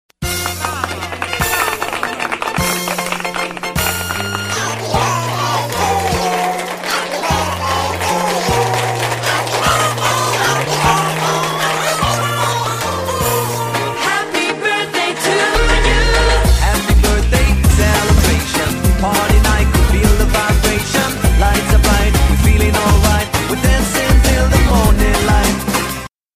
happy birthday mix

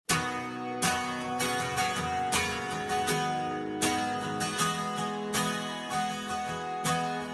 nice guitar loop

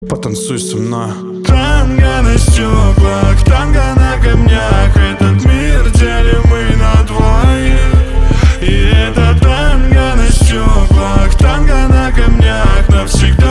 tango

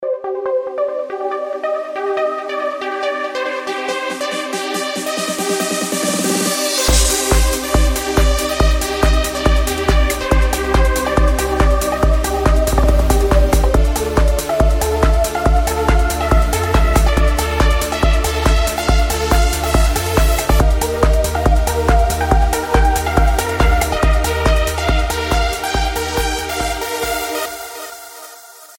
future trance

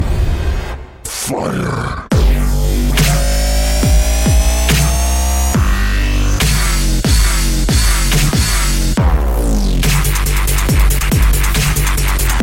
robot dubstep beat

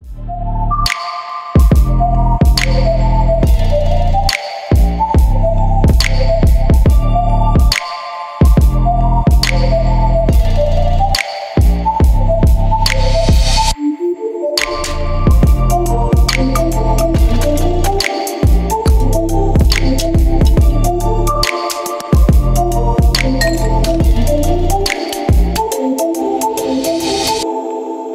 carol of the bells trap remix

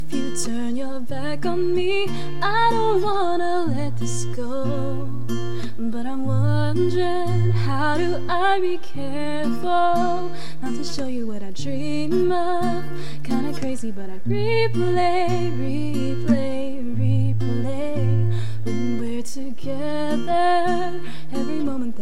acoustic

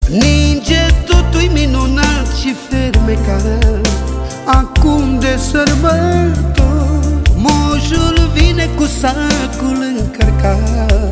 manele, ringtone

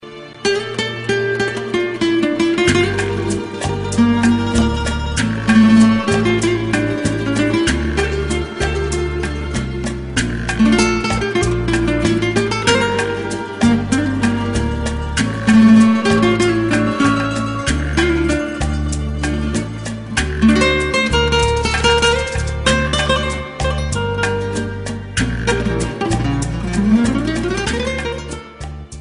spanish guitar

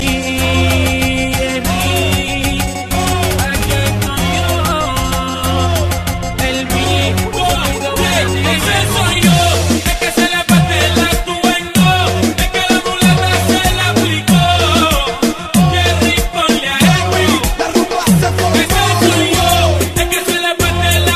tuba, ringtone

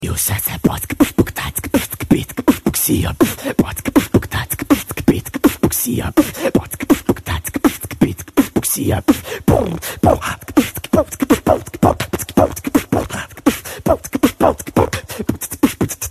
beatbox

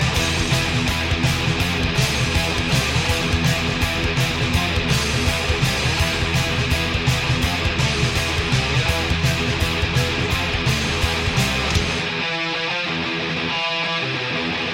metal storm; ringtone

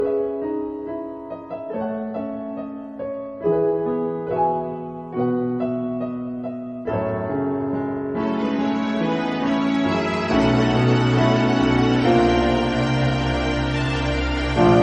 instr

